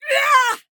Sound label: EMOTE Woman, Anger Fight Grunt Big; Universal Emotes